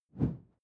whoosh simple short airy low

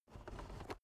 car headrest full adjustment soft

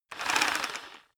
rock drill hammer rev short; maschinen; bohrer; soundpool

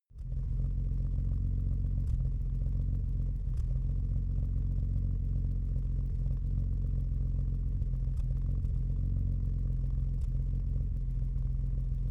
car jaguar e-type idle exhaust

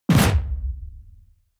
sci-fi shot tonal sub noise; schusswaffen

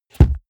car bmw e46 interior sun visor shut

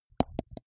teeth clench multiple; koerpergeraeusche; menschen; soundpool

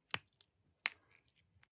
gel dispenser press squish soft; haus garten; badezimmer

